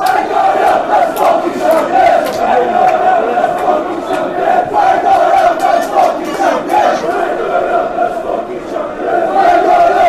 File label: were going champions